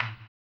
HAMBONE; Blues & Soul

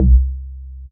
C Bass

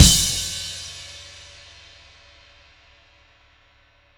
Drums Ending, Indie Rock; Session 01 - Brighton; Sonic Foundry (Sony Creative Software); Indie Rock Anthems

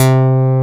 MID BASS, swing synths; Zero-G - Funky Elements

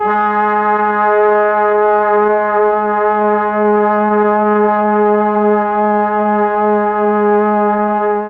G#3 BRASS, Mellotron; Mike Pinder - Mellotron Archive CD; HALFSP.BRASS

